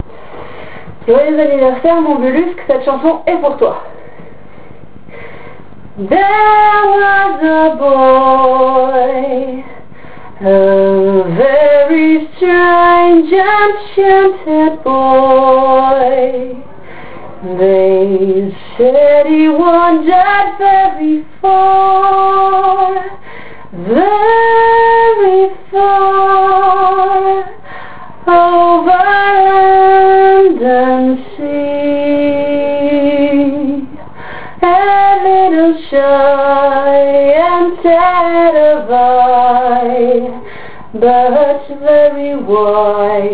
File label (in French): Chanson